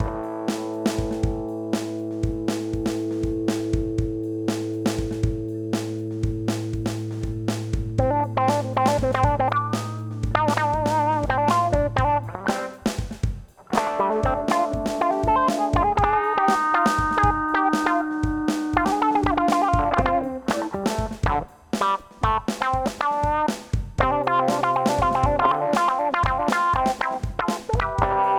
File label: Zoom G11 Funk